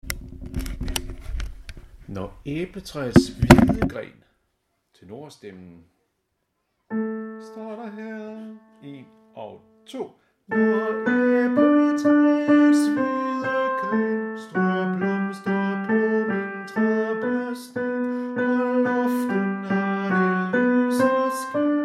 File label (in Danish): Når æbletræets tenor; harlev koret